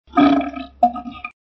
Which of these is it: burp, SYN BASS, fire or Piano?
burp